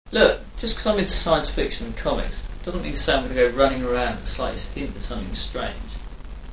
scifi; Scanner: "Look, just because I'm into Science Fiction / and comics..."; Foiled

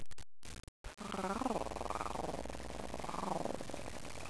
purr

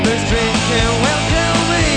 drinking

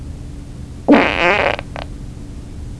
squish

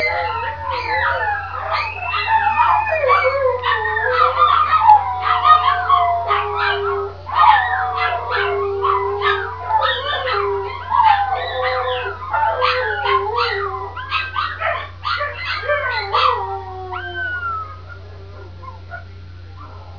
dog song

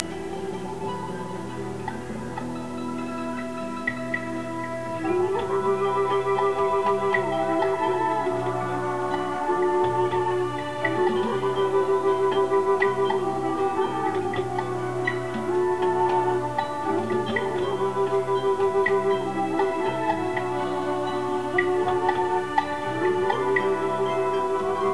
jungle

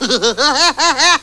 giggle; (Krusty) His tell-tale laugh; Simpsons

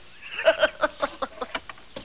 laff

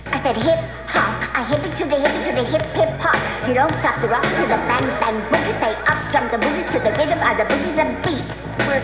hip hop

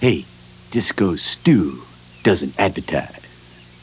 disco

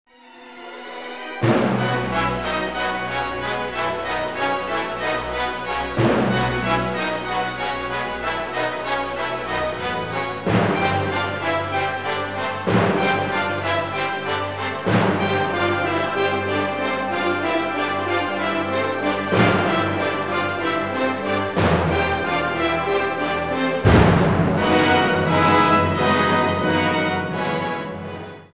firebird

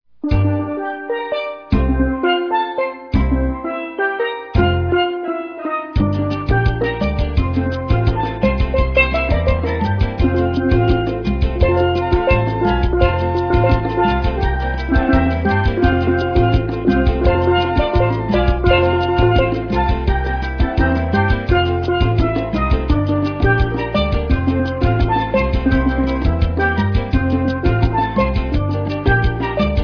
yellow bird

